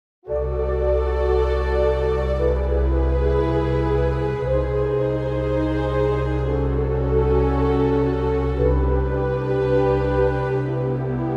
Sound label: C orchestral